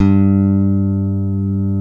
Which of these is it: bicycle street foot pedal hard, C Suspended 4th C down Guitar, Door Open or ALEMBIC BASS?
ALEMBIC BASS